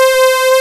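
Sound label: POWER C6, OBERHEIM, Analog; USB Soundscan vol.49; Analog Fantasies; AKAI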